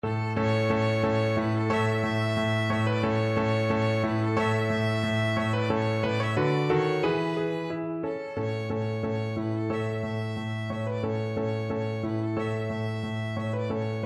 spring, violin

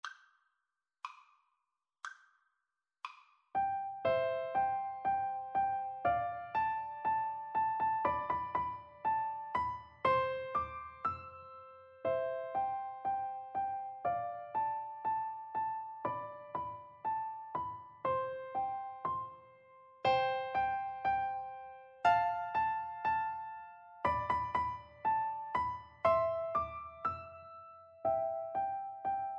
piano duet

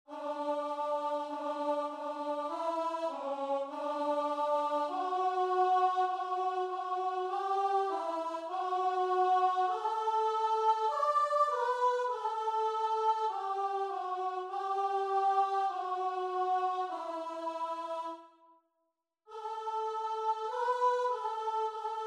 fairest lord jesus, guitar and vocal

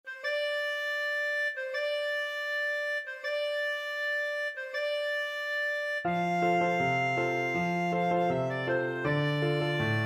magalina zoula, clarinet

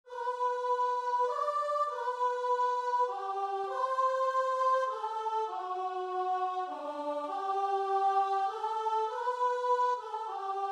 christ is made, guitar and vocal

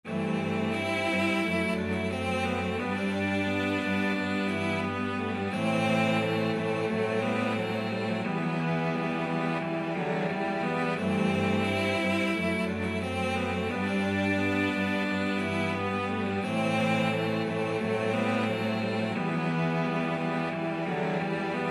cello quartet

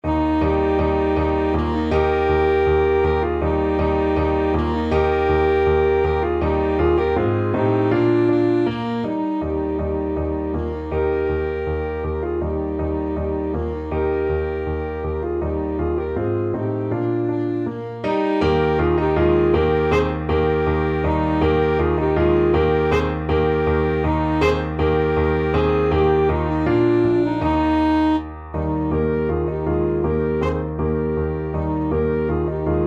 spring easy, alto saxophone duet

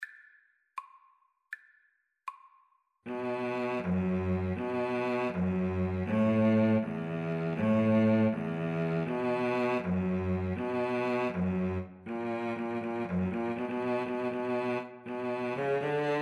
brian boru Cello Duet

